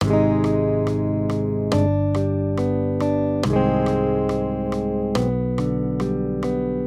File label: maj triads; accompaniment